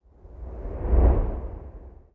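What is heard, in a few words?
past the camera; kolem kamery; Mafie 1 cz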